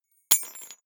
key ring small drop parquet soft

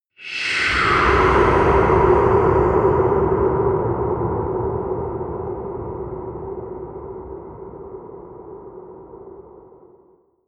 air sweep long

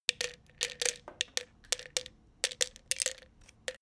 pills drop into box

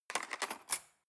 cutlery cake fork drawer scrape

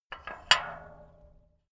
metal gate bolt

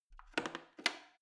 plastic button creak defect press

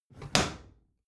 bathroom drawer close heavy

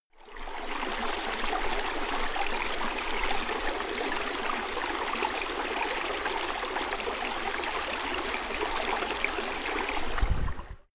stream

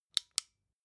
flashlight off

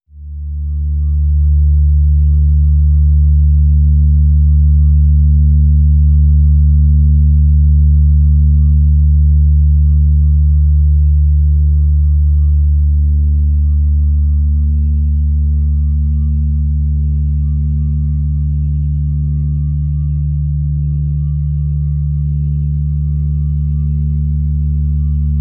organ tone d

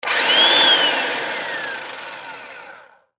buffer on off

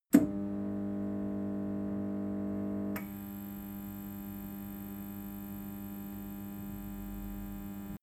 fluorescent lamp slow

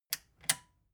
metal button click power off slow

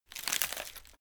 wood crack slow soft

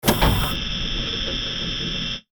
pneumatic blast

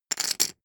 poker chip stack rattle

